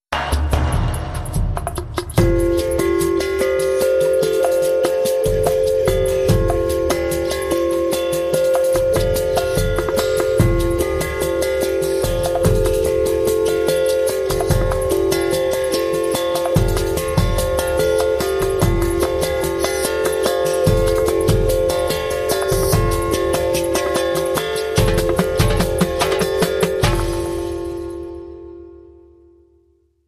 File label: Sweet Beat